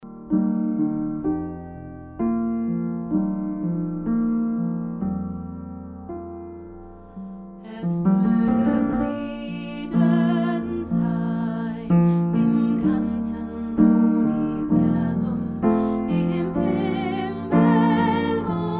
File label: sopran